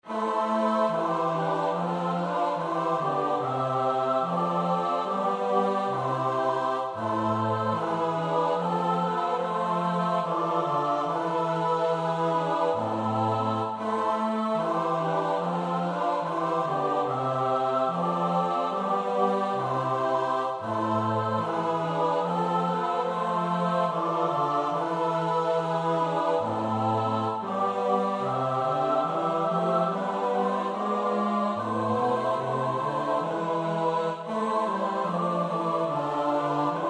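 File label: soprano alto tenor bass; Bach (Johann Sebastiaan); BWV 178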